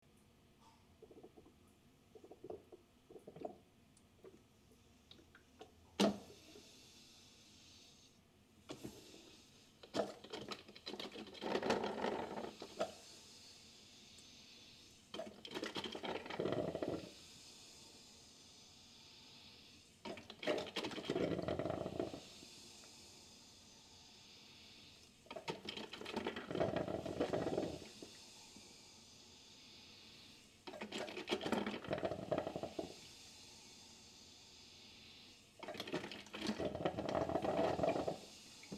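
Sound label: drip coffee maker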